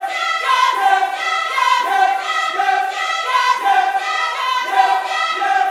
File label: CIR YES, Vocal Gospel CIRCLE LPS; Vocal Planet Vol1 Gospel